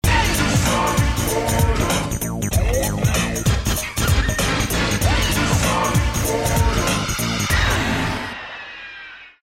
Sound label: game over; sonic 16bit; soniccd